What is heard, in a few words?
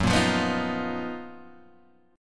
F sharp Major 13th Guitar Half Step Down